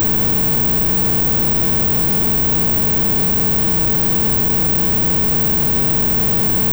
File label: modulated, bpsk